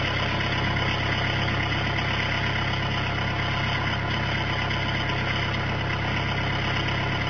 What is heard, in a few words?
car idle